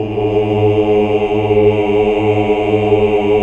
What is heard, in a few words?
OOH, OOOH Uni; Sound & Vision - Gigapack II CD 1 (Roland)